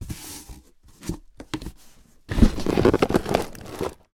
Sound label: pack; Original TK Sounds